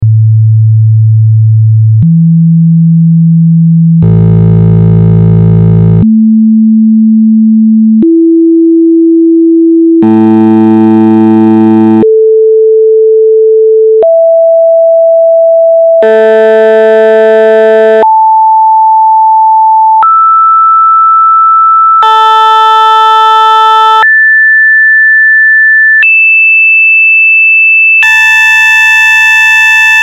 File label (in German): quinten rein; Quinten in verschiedenen Oktavlagen; Psychoakustische Grundlagen der Musik